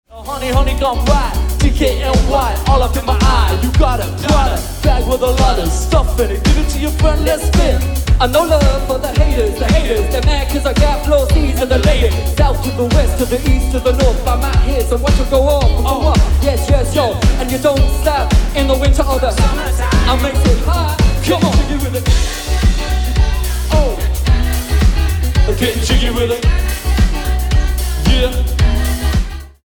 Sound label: Soul Band; Surrey